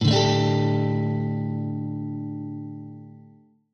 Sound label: D sus4